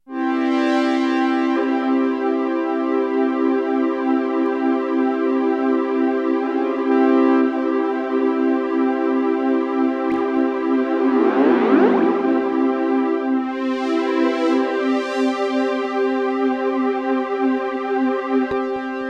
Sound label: chorusmodes, access virus synth